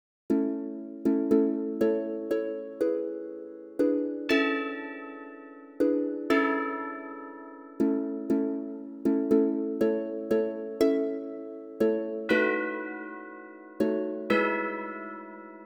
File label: harp and chimes; Spring; Gates